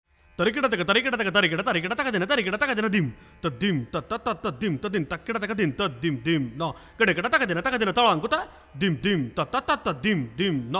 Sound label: konnakol